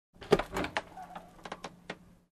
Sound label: Aircraft Locker Open